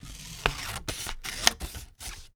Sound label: Cutting Cardboard with Scissors; Office Supplies; 1001 Sound Effects